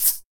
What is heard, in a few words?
SHAKER, HR-16 DRUMS; Drum Mashines; EdgeSounds